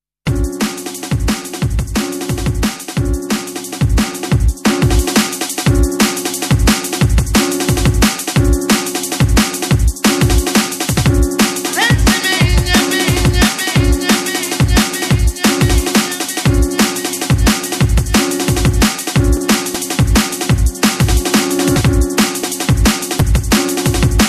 Liquid Soul Sessionz, Drum and Bass Jungle; UK Bass Radio

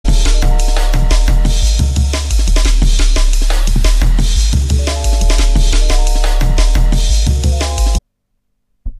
Drum and Bass Jungle, UK Bass Radio

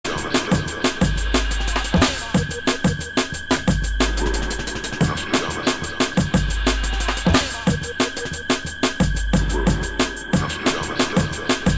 Drum and Bass Jungle Old Skool, UK Bass Radio; LIVE

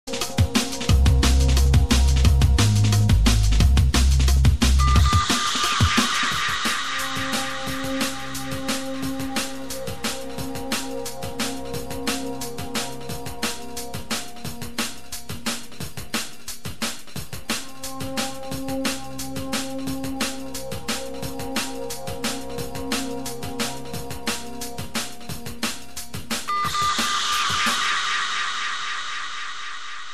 Drum and Bass, UK Bass Radio

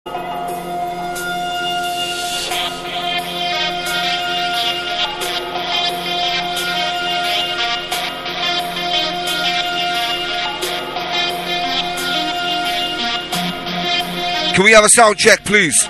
Drum and Bass Jungle Old Skool; UK Bass Radio; LIVE FORM CARDIFF

